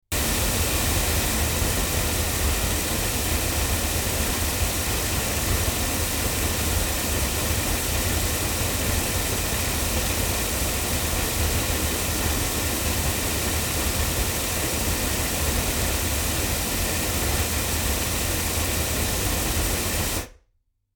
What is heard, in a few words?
loudspeakers